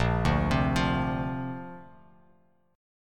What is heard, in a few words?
A sharp Major Add 9th, Bass Standard; arpeggiated